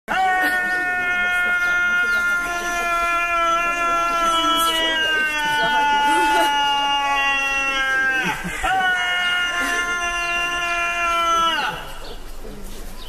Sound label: long goat scream